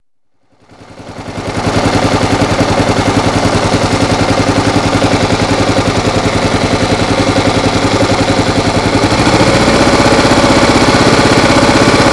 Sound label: engine knock, klr650; what's that noise